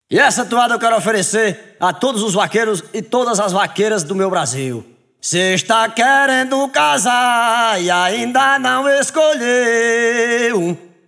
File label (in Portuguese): toada